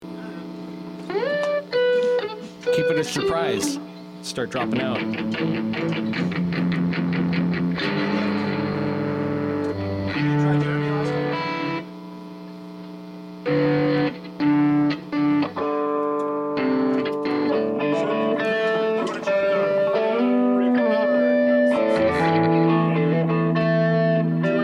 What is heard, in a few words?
Background Noise Mix